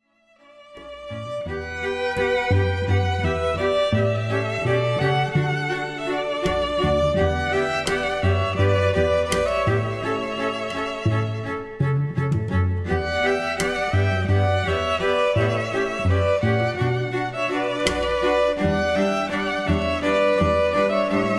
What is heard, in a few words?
cymbal quartet